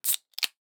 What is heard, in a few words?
Can Open; Survival Game Sounds - Lite Edition; Objects and Items